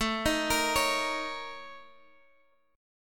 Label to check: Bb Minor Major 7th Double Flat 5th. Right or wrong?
Right